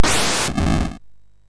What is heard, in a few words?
sid MOS6581R3; Gremlins 2-The New Batch